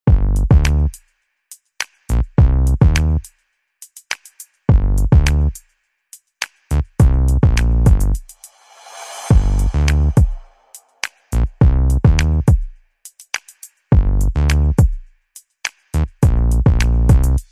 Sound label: Drums and Bass Only